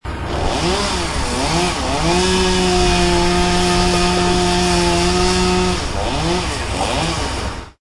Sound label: saw